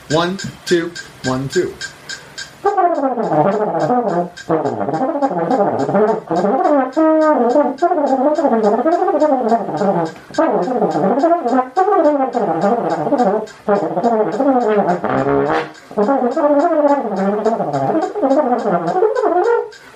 trombone

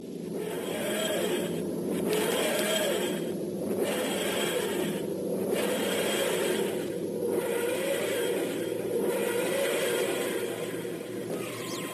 tardis noise

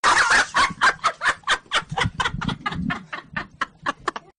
laugh

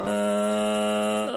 moans; bobs burgers

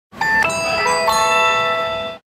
magic band sound effect; Walt Disney World

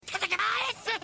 ringtone

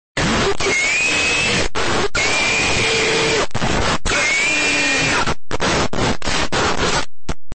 scream

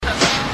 spit

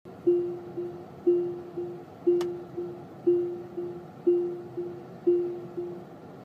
tesla chime

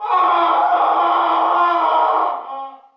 wail; killer7

